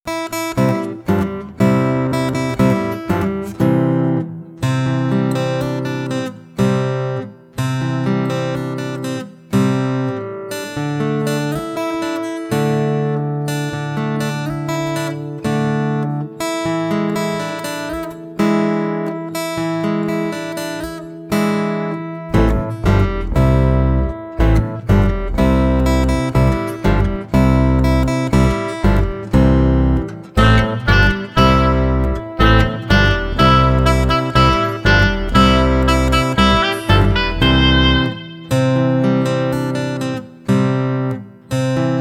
Instrumental

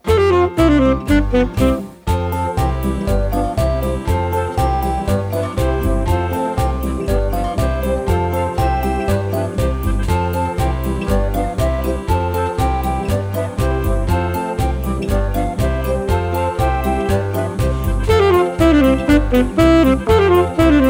Instrumental

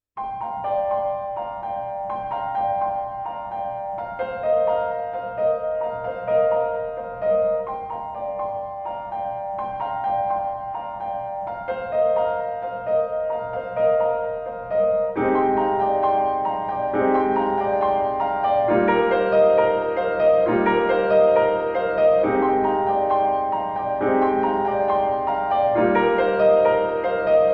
Piano; My Neighbor Totoro

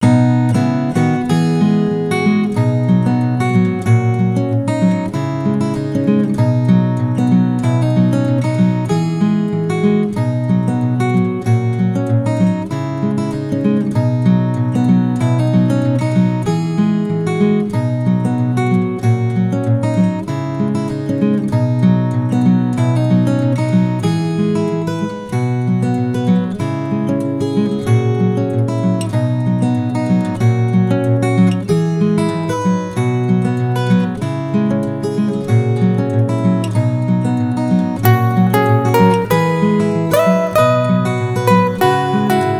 Instrumental

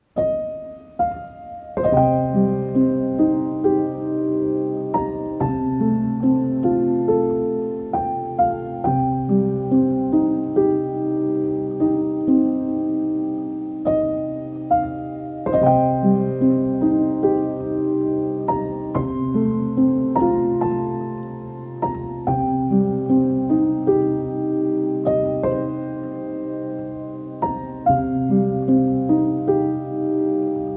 Piano; Nausicaä of the Valley of the Wind

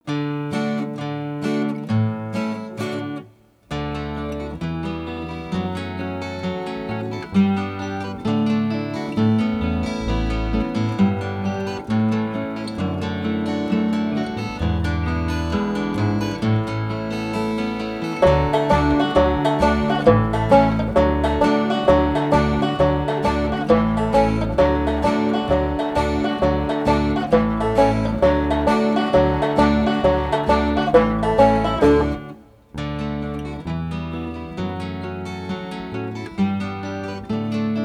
Instrumental

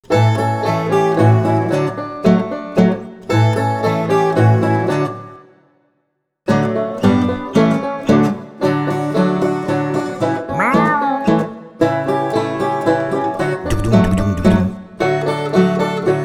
Instrumental

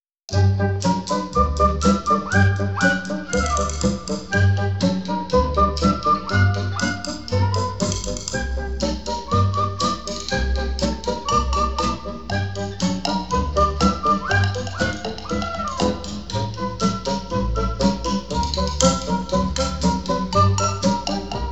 Instrumental; Disney

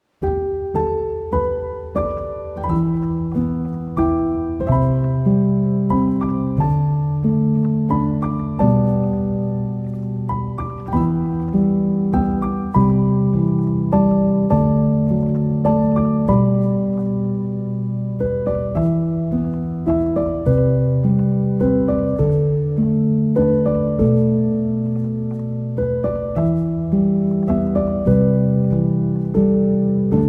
Sleepy Version, Piano Version; The Secret World Of Arrietty

